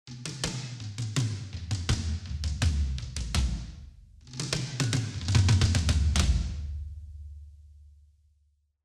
yamaha 9000 toms; klangmacht

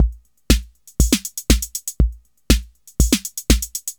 analog drum machine dry; modular effect board; sonic instruments

